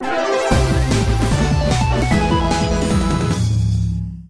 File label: Win; Mega Fighting Bots